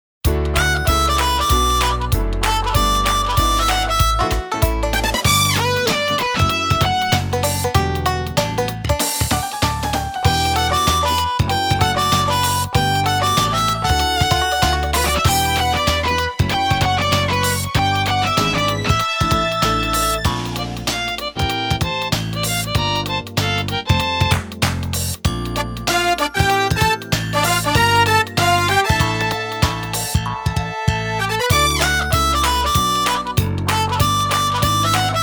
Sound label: jingle; ponderosa